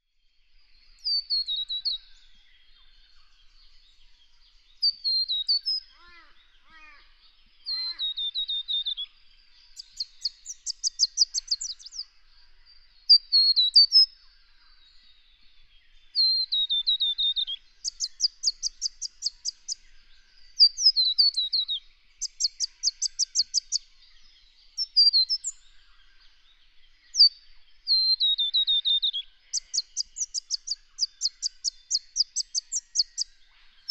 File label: Scarlet Honeyeater